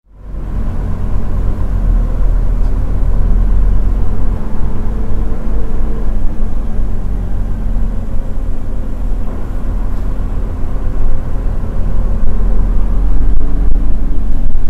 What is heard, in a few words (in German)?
Geraeusch Busfahrt; Hit from Heaven